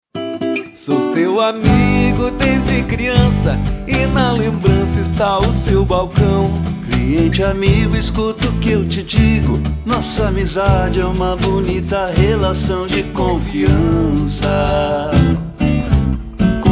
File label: Jingle; Mês do Cliente